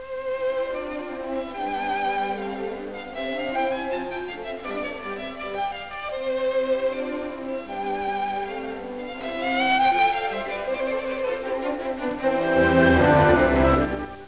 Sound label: mozart violin rondo